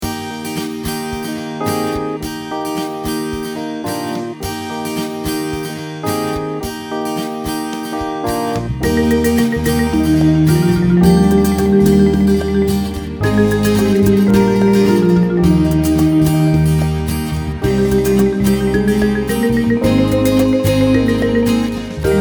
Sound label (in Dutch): Gezang instr; Studio 38 Maartensdijk